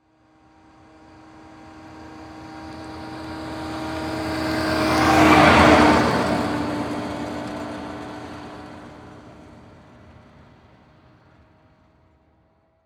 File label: Truck Ford Ranger Drive By Slow; transportation